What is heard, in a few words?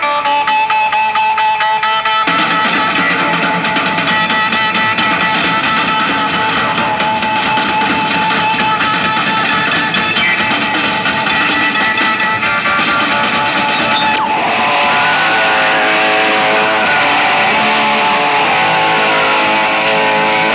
Drum Solo; Berlin 1972; August 30, 1972